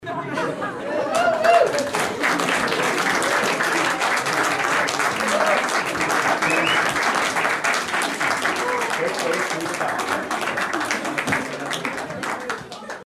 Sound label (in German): applaus